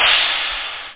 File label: RIDE CYMBAL; drums